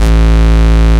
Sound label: Bass Root A